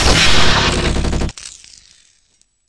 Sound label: test mono; VoxelEngine